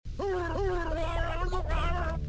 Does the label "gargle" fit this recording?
Yes